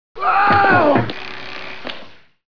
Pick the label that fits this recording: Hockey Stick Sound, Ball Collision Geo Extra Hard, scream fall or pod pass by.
scream fall